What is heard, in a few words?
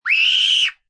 Scream Kid Shrill, Scream, child, girl, short, high-pitched, fear, terror (human voice); Screaming (Sound Effects:Voice)